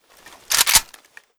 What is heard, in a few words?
svu misfire